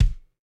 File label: kick, house